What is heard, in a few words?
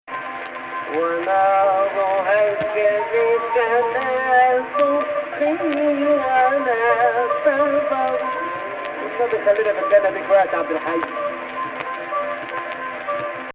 AHH, Egypt Rast